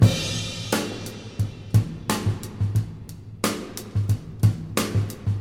89 bpm drum loop sample G sharp key, kick tuned to the G# note